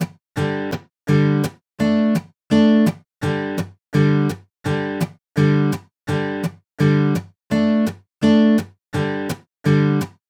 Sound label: Hanging Eleven Reggae 8